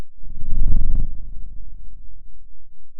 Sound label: pop, Sound of a dinosaur